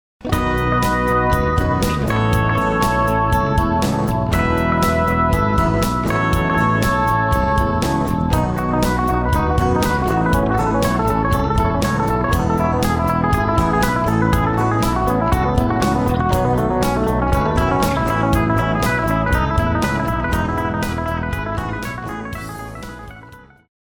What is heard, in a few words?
western wind, music, Country